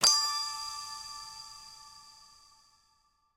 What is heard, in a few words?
jackpot Gem; imperialriches